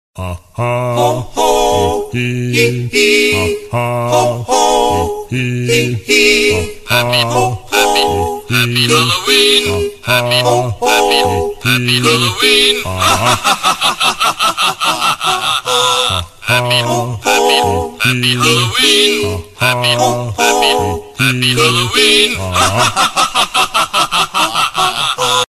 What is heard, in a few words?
funny opera ring